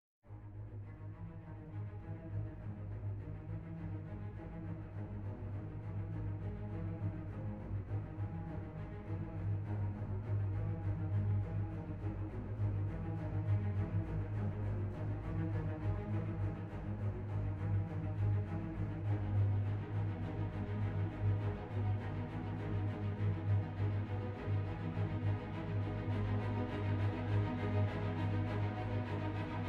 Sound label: Strings; Tron Legacy